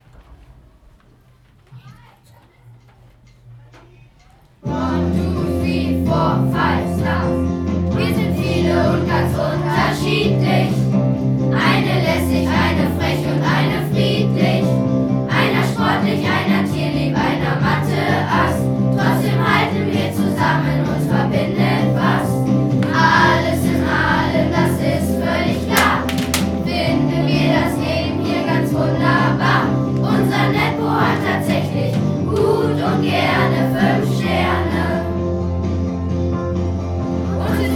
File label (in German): Klasse 6d Gesang